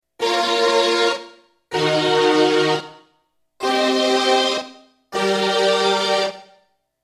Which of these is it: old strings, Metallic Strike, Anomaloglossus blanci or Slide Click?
old strings